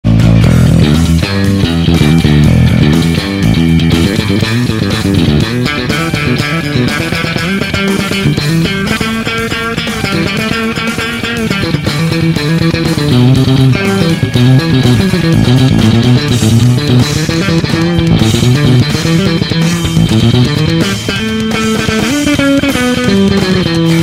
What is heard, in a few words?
Coffee Shop Solo